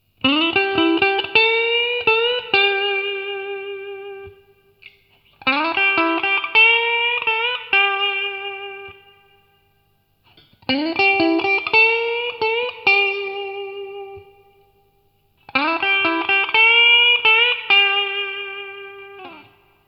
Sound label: neck adder